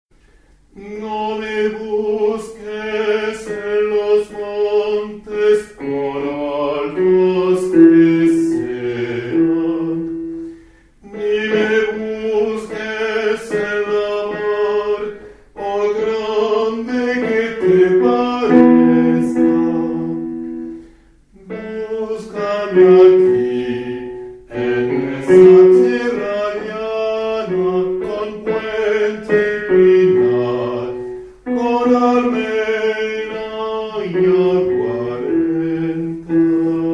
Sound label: Basses; ESTA TIERRA